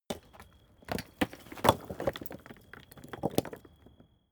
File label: stone medium fall; natur-tiere; steine